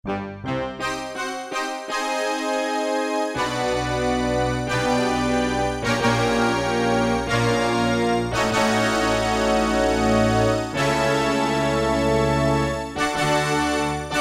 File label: DIGITAL ORCH, Casio VZ-10M; VZ ROM Card